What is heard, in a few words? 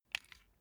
puncher push down